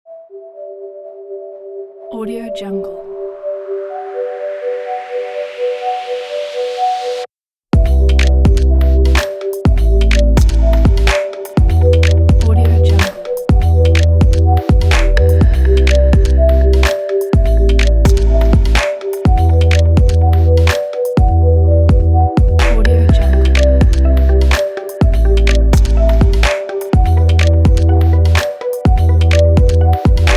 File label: future tech